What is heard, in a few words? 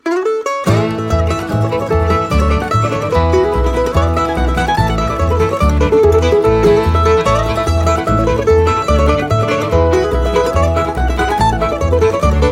Bleak House Step, Northfield Mandolins